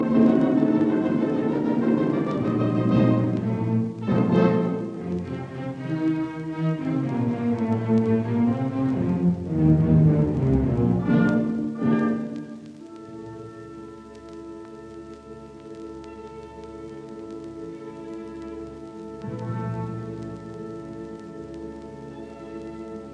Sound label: Symphony in D minor Choral Finale Presto Allegro assai; Op 125; Beethoven